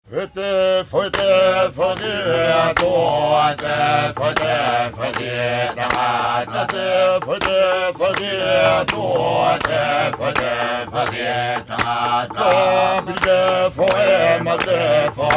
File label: polynesia men, polyphony